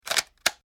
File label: Click Mechanical Weapon Clip Latch; Latches